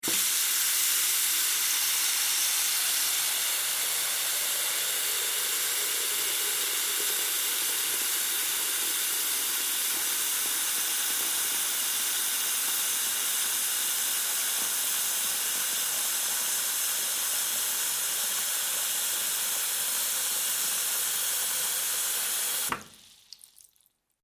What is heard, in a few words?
Water Sink Fill With Water Long, bathroom